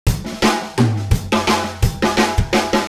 Drums Tempo Elastique

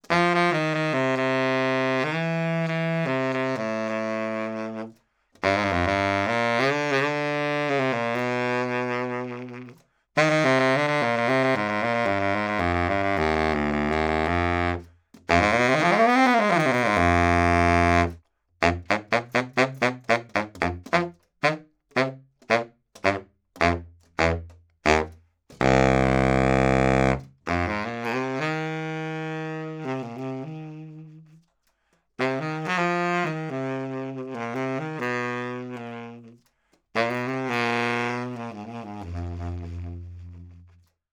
Sax Baritone 50cm C Mid

